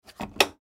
Dishwasher Close, Dishwasher Close Soap Flap Plastic Lid; General Electric Ge Appliance; Blastwave